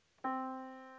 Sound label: yamaha Disk